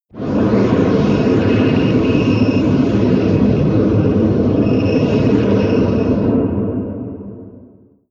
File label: mana leak target; weapons; dota; keeper